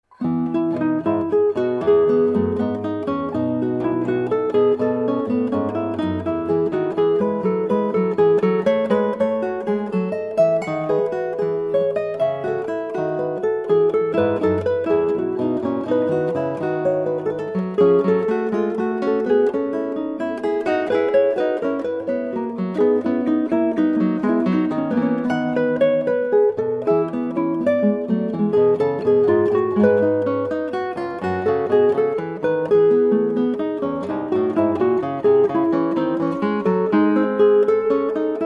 Prelude 19 Book 2 Well-Tempered Clavier BWV 888, 8 String Guitar; Bach